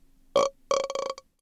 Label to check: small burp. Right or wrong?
Right